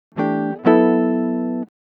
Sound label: 102 NuJazz Rhythm Guitar Emaj, Jazz Guitar Loops; Pro Tools Essential Loops; Digidesign